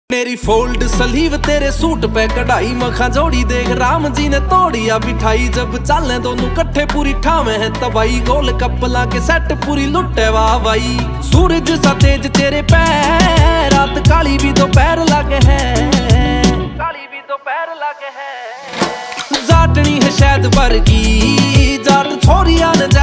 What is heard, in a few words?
haryanvi ringtone